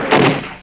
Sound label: crash